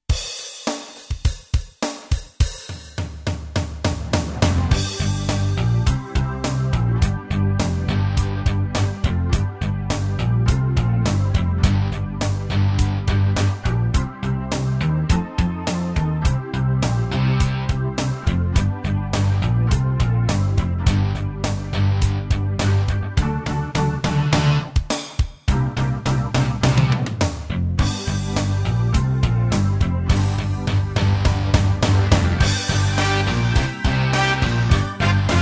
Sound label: Rock Roll Bass; Meespeel CD